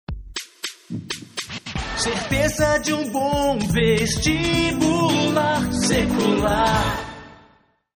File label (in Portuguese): vinheta